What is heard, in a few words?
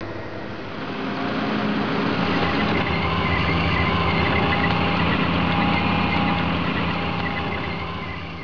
unit sound light; netpanzer